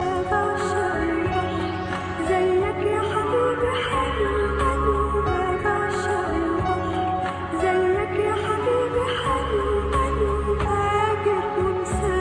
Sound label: ARABIC NEW HIT